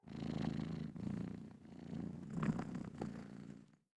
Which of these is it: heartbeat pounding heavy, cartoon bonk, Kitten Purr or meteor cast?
Kitten Purr